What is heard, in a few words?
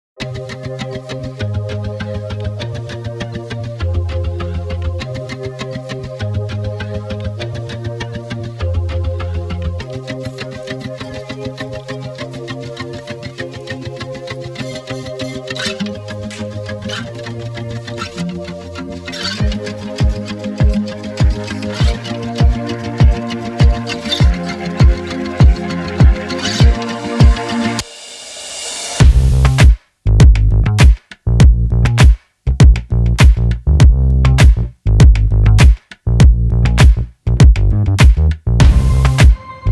(Instrumental)